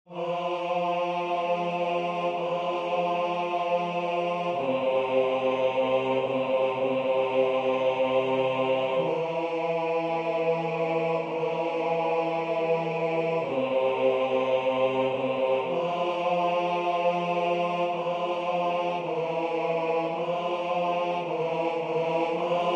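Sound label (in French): basse, Musique sacrée; Parce Domine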